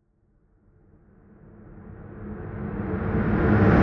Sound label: Coming Moving Sound Foley